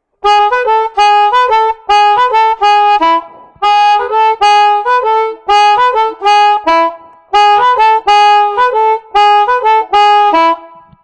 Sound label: mic tech pucker, harmonica